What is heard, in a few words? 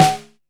DINGO BOY, SNARES; Back In Time Records; Big Bang